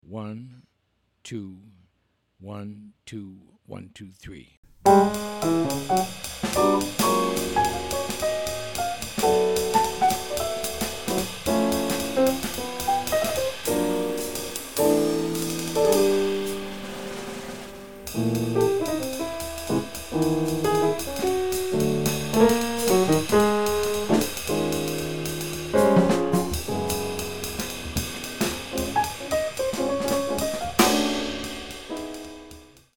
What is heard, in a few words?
minus Bass